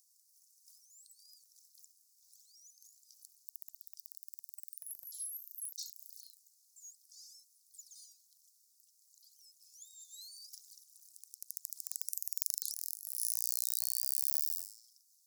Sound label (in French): voc clics; globi